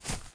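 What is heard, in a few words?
grass run right, footsteps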